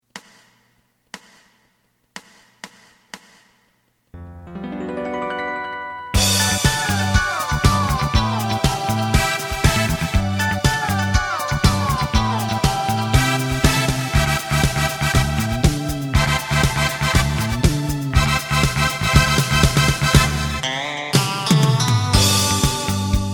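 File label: side guitar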